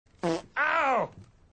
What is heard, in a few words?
fart needle, ringtone